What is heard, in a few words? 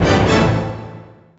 hit, pinball; Excalibur